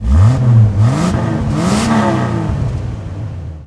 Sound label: Jeep Wrangler Rev; Trucks